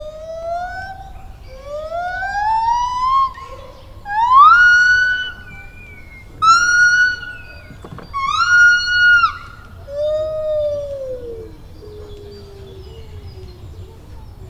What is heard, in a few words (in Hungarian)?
magasba törő ének, fehérkezű gibbon; debreceni zoo 2012; marantz puska